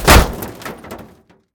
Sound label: metal; CosmicRage; combat; bigbite